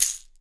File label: CAXIXI, SHAKERS; Big Fish Audio; Ross Garfield Drums VOL-2